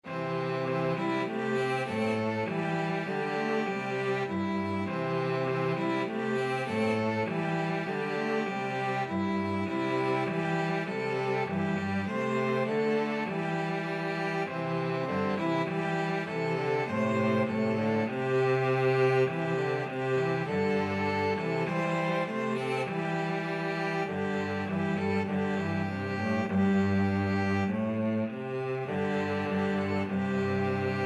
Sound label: string trio